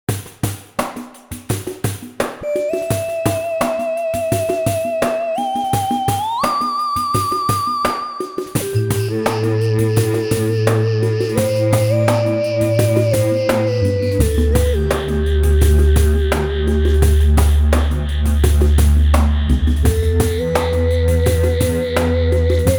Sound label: Music Level Forest; Squidgies Takeover